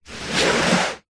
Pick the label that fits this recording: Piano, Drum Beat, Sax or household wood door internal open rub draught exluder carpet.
household wood door internal open rub draught exluder carpet